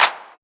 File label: clap single hit b key, Royality free clap single shot tuned to the B note. Loudest frequency: 3150Hz; percussion single hits one shots